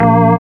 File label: SYN ORGON; Sound & Vision - Gigapack II CD 1